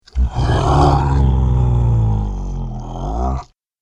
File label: Monster Warning Growl